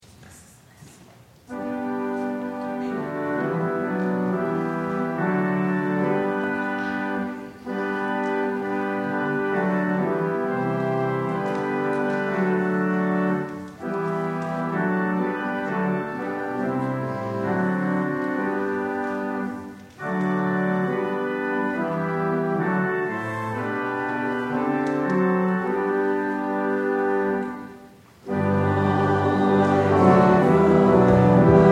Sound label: Closing Hymn